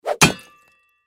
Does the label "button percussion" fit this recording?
No